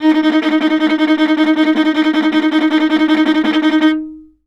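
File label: VIOLA tremolo D#3; Orchestral; DirectWave; Varazdin Orchestral; Viola II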